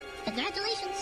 congratulations positive

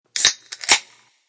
soda can opening

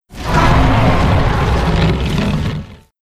roar; spino

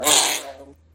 growl

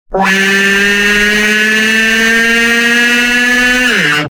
high pitch crying

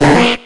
slowpoke, pokemon slowpoke cry

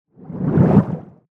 woosh underwater

